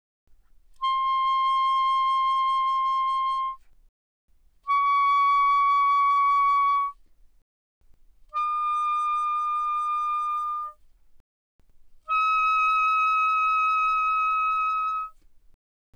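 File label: Soprano Sax Vibrato pp C6 Eb6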